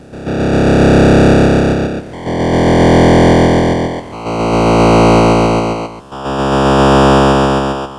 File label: Atari 2600 Bass, chiptune